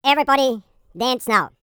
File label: ENGLISH, YODEL YELL, World Voices; ILIO; Vocal Planet